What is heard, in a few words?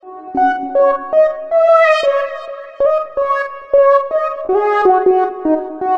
Lead Funky, Drum & Bass Keys; Sonic Foundry (Sony Creative Software); Knutrix Drum & Bass